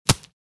Paintball gun impact hitting a human body; sport

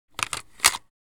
Cassette deck player portable insert tape

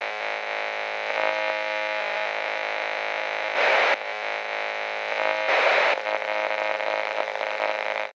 Walkie talkie static

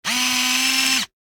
Camera movement lens focus zoom

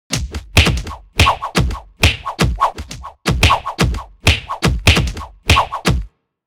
Flying combat anime fight

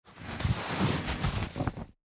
Silk bag manipulation material

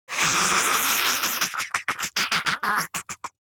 Demon beast imp monster maniacal laugh

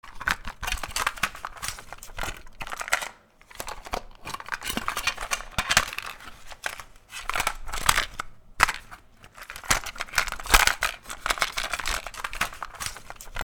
Cassette tape handling rattle close perspective

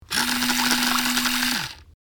Toy boat in water remote controlled

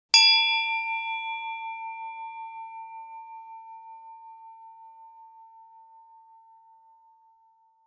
Handbell single ring

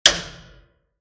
Piano surface hit with stick

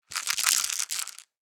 Pill container shaking pills inside; medicine

